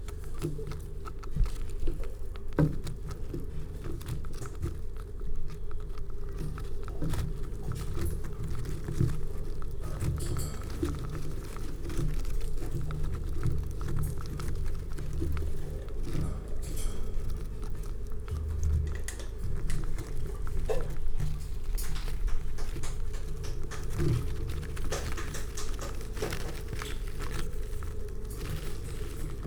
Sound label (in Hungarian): rágcsál, kerti pele; jaszberenyi zoo 2015